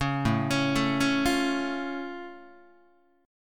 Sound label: A Augmented A down Guitar; arpeggiated; JGuitar